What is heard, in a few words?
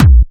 Bassdrums Clubby Kicks; Vengeance Samples